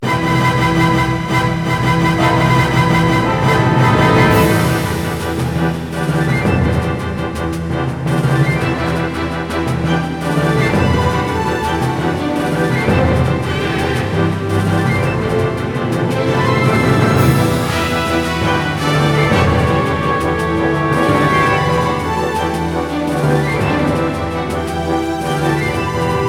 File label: Battle Boss Battle